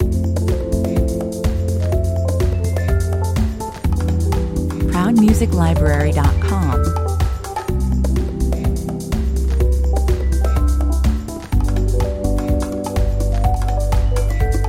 Easy listening in the Lounge of the Latin Express with mainly cool and jazzy grooves, 125 bpm